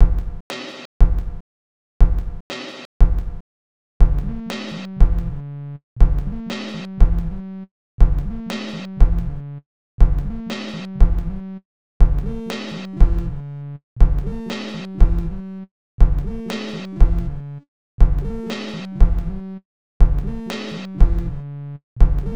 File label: Sinewave